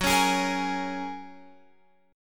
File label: Gb Major Add 9th, Guitar Open F